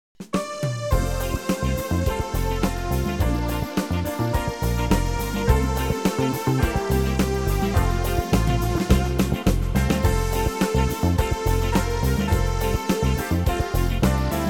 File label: disco